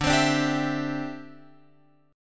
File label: D 7th Suspended 2nd Suspended 4th, Guitar Open F; strummed; x,9,12,9,10,11; chord